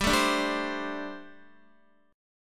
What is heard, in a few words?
G Suspended 2nd Suspended 4th G, Guitar, 4F, 4A, 5C, 5G, 5C, 6E; strummed; x,10,9,7,7,8